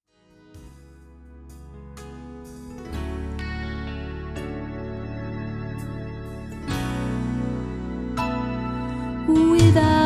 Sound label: Variety Duo; Boston